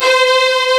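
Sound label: KURZ F5, strings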